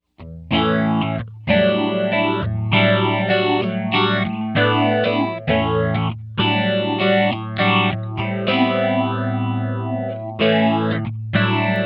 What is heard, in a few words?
phaser; mobius